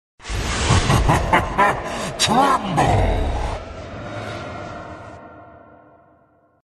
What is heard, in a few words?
hahaha tremble